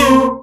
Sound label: Synths C; Vengeance Samples